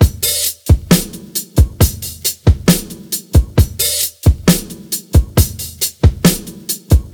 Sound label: 67 bpm drum loop d sharp key